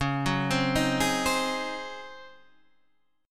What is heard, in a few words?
C sharp Major 7th Suspended 2nd Suspended 4th Guitar Standard; C#M7sus2sus4 arpeggiated; JGuitar